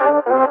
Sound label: Vocals; Launchpad Mini MK2; Lightshow Pack Project